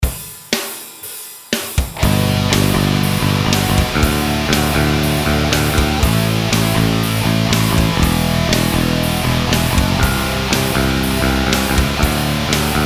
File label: two strings